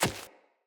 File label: Pack Wolf Step Single; Animals; Footsteps